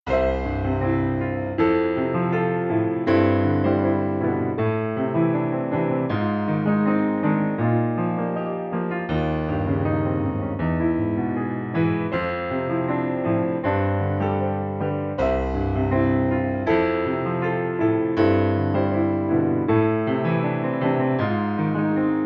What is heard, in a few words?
Piano